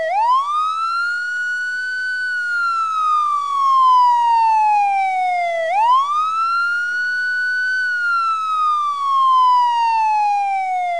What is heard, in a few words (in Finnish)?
poliisi, aanet